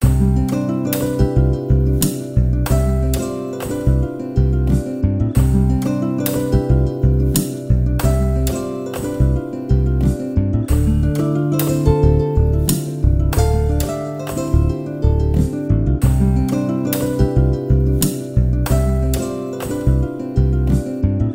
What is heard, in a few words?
Relaxing Instrumental